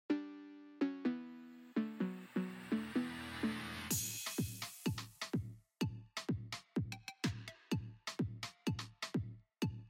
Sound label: Monster Bass, phonk music